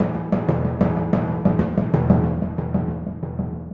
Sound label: Timp a